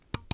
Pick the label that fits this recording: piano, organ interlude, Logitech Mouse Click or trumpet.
Logitech Mouse Click